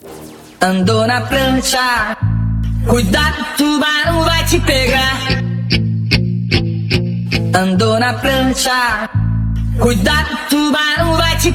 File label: Remix